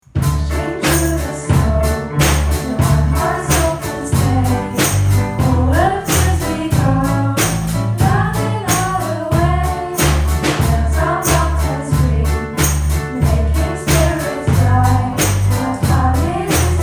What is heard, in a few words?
Band Version, Jingle Bells Variationen; Adventsdekorationen und Jingle Bells Variationen; Schuljahr 2020-2021